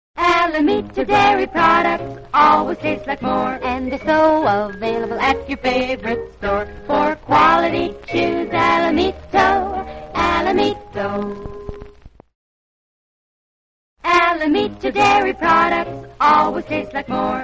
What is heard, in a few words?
radio jingles; alamito dairy; allied radio artists